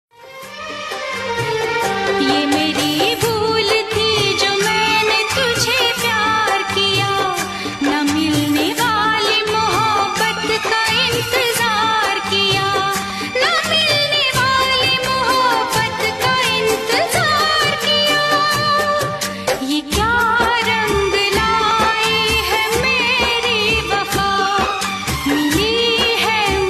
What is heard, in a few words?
Sad Ringtone